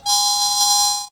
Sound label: victory; goodnight jellybean; godot